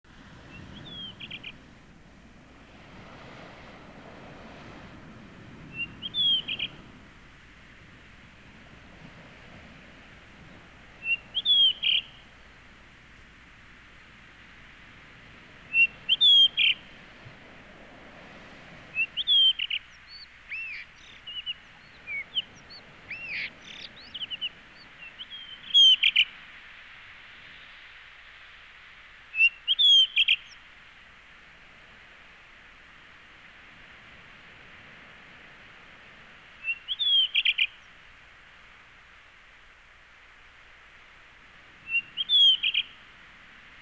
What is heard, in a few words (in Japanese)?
イソヒヨドリ, 野鳥の鳴き声; 校庭やその周囲のバードウォッチングの時に樹木や電柱等から聞こえるかもしれない野鳥の鳴き声; 筑紫小野鳥観察ｸﾗﾌﾞ支援